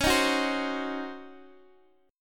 C sharp Major 7th Suspended 2nd Suspended 4th, Guitar, DADGAD; C#M7sus2sus4; strummed